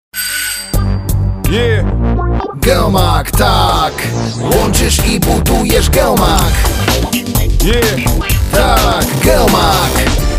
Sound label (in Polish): reklama spiewana